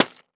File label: Impact Rocks Hit By Solid Metal Pipe; Impacts